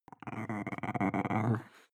chihuahua growl soft

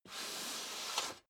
step high heels metal rattle slide long; schritte

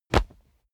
combat cloth hit; krieg-waffen; nahkampf; soundpool

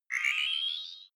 game start; signale

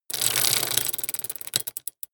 metal gear small spin rattle; industrie wirtschaft; metall

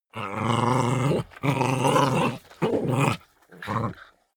chihuahua snarl fight; natur-tiere; hunde

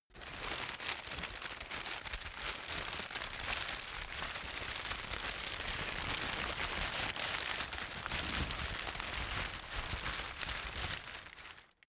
stuffing paper rustle; industrie-wirtschaft; bueroarbeit

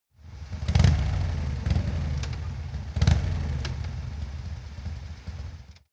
motorcycle harley engine low rev

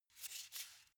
car flip key handling

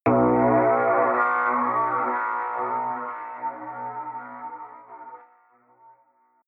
synth hit phase wobble, hits impacts; whooshes rises

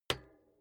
bicycle street kickstand hinge up soft; fahrrad; verkehr transport

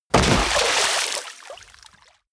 body fall water harsh shallow; krieg-waffen; nahkampf; soundpool

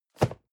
cardboard box medium place slide; objekte

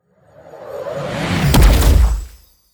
promo pad; whooshes rises

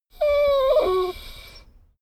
dogo argentino whine desperate; natur-tiere; hunde

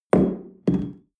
espresso upset tablecloth medium; sport-freizeit; restaurant-cafe

